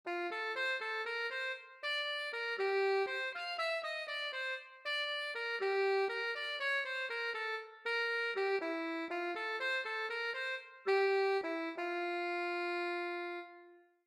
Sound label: alto sax